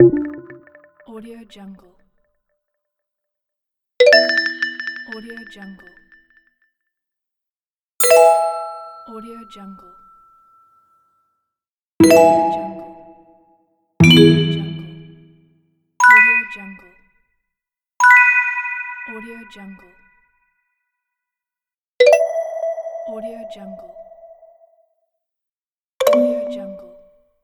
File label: notification pack